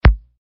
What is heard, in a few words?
hit; revolution